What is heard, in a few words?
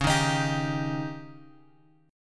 C sharp Suspended 2nd Flat 5th C sharp, chord; C#sus2b5 Chord {X 4 1 X 4 3} strummed